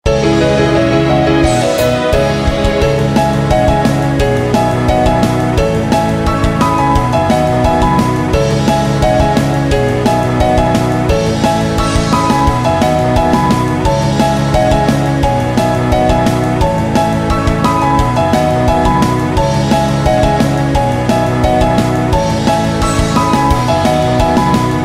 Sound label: Jingle